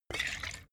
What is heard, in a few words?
bottle vodka upend; berufe